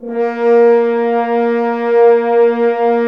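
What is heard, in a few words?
BRS, French Horns Dry f; Roland L-CDX-03 Disk 2; F.Horns 2 f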